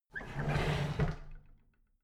drawer big clatter close heavy

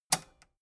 metal button robust spring release

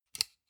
sunglasses plastic grab soft

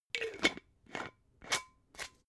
glass bottle massive close slow

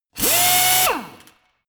impactor hard burst

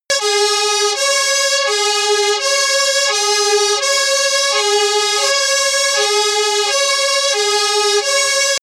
fire truck siren eu

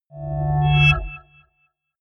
chord swell short

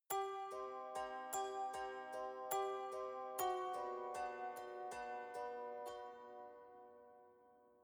haunted strings sinister

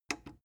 plastic button small simple click slow

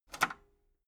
metal lever button radio disable medium

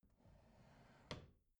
bathroom drawer close soft

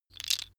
tiles wood

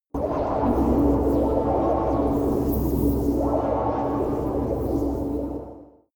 space fabric liquid transport close

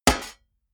shovel impact

